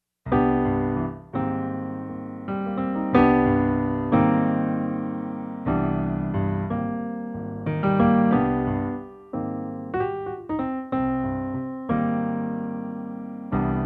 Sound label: smooth jazz